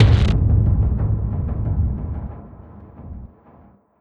Mountain Small Fight End; sky break

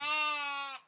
Lamb Idle

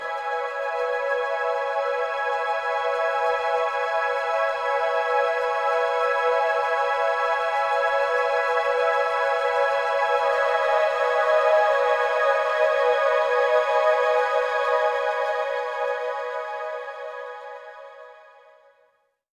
Overlay Drone

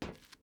metal step; teardown; ps5 windows xbox series xs

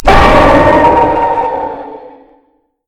Jumpscare Noentry

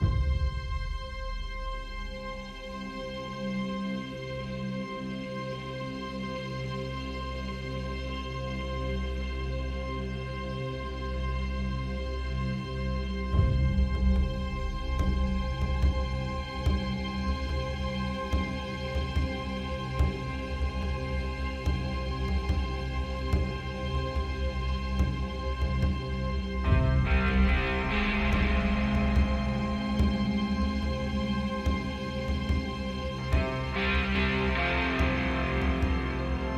Amb loop; Boarding the Ship

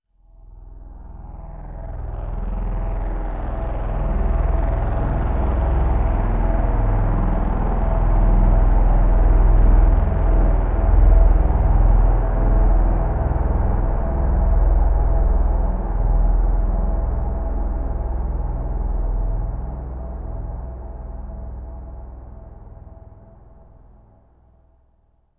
Gridnode Overlay Drone; TheView

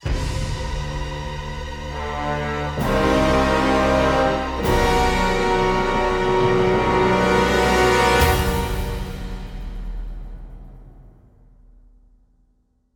Game Over Stinger

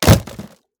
plastic break; teardown ps5 windows xbox series xs gamerip 2022

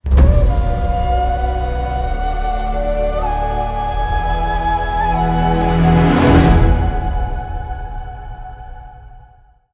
Get Ability Stinger; Ori and the Will of the Wisps; desert

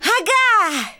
Emote Cheer; The Princess and the Frog; wii windows; Twitch Skijump

